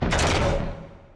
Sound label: cargo bay hatch closing stop; Factorio